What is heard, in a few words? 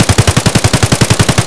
ak74m outdoor distant; Escape from Tarkov